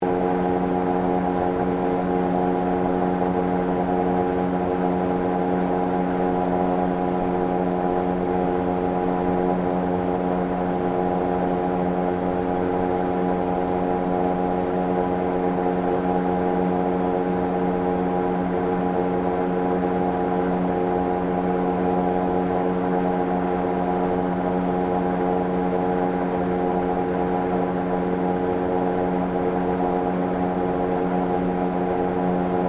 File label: Airboat Steady, Transportation, Watercraft, Airboat, Steady, Speed, On, Board, Perspective, Loop; SoundEffects-BlastWave